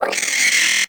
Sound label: Hyena Micro Vocal Angry; Warframe